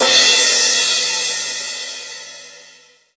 crash cymbal drum sample d sharp key, Royality free crash cymbal sample tuned to the D# note